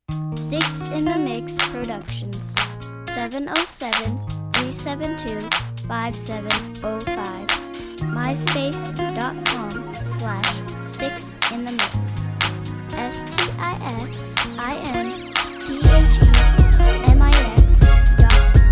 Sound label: guitar; guitar twerk